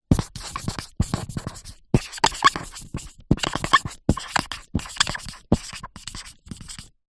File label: office marker large write fast whiteboard